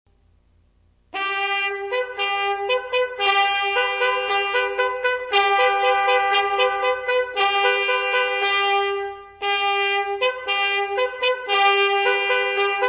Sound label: tromp, instruments medievaux